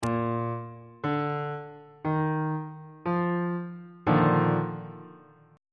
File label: e flat major 7th suspended 2nd 2nd inversion on bass clef